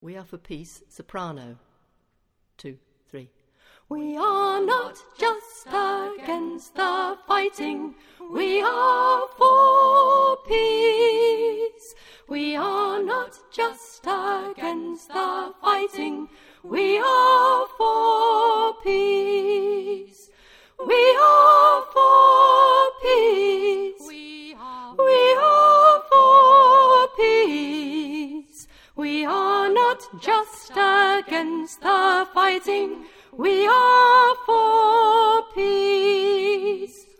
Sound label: soprano